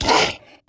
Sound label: zombie attack